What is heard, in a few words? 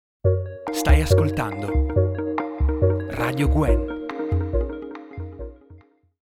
Jingle; stai ascoltando Radio Gwen